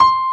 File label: PIANO; sound canvas